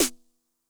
SNARE DRUM High Tune Low Tone, 909; classic machines; 909mod

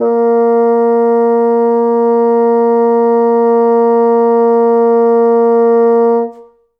Bassoon A#2; Best Service ProSamples vol.51 - Classic Orchestra 2

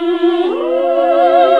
SING, CLASSIC VOX; Best Service; Hallelujah

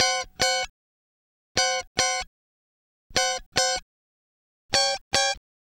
Guitar Licks

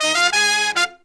Pop & Funk Brass, 096; Best Service ProSamples vol.25; AKAI

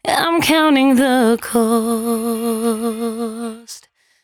113bpm C#, Velvet Vocal; Zero-G

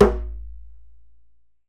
DJEMBE HARD

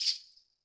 HiHat & Crash, Roland TD-20; HiHats

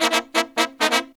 132, Pop & Funk Brass

